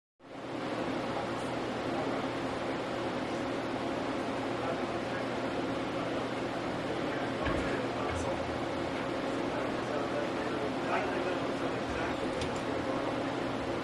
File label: Computer Room Fans Hu